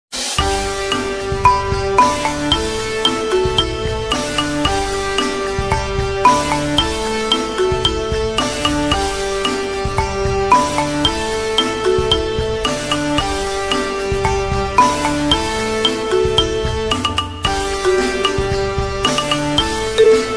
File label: Marimba